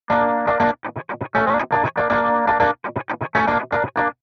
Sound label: cutting